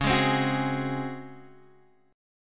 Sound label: Db Major 7th Suspended 4th Db down Guitar Half Step Down